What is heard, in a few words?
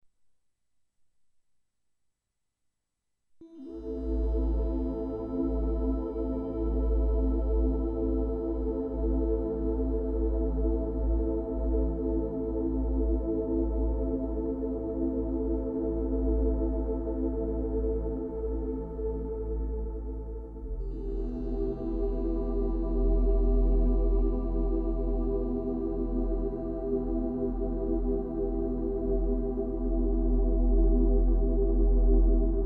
Relaxation Music